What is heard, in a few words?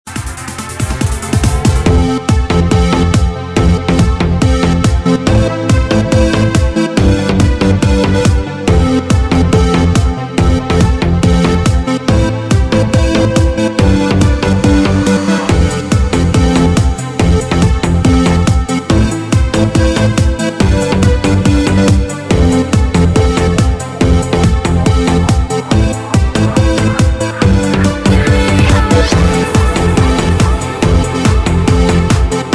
electro house remix